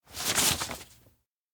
paper flip single; anemoi

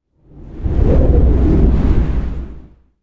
elec small tornado; anemoi

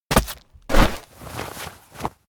jump on back; rikka; anemoi パッケージ版 1.1

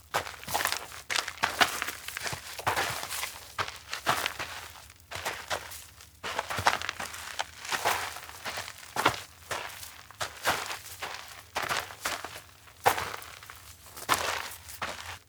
steps outdoor forest walk group; anemoi

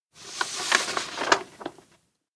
request form tap; anemoi

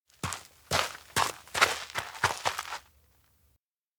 steps outdoor schoolyard walk small; rikka; anemoi

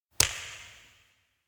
grab umbrella; anemoi パッケージ版 1.1

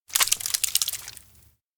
bag place ground live fish; anemoi

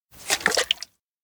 canteen raise; anemoi

